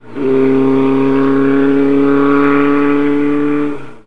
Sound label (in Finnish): moottoripyora